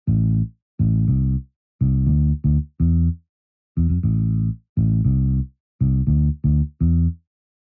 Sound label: Bass Dry